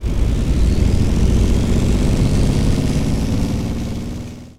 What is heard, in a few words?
leo2a5 engine load, engines tanks; War Thunder